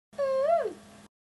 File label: oooo